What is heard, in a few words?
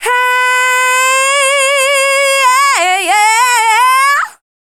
Dance Vocals, FEMALE